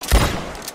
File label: pistol; rdr2